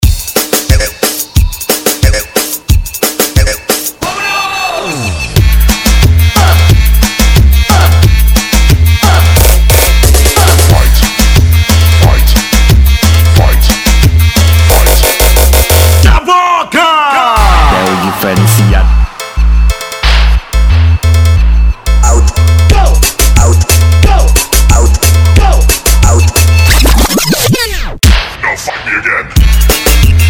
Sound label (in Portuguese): loop pressão tecno brega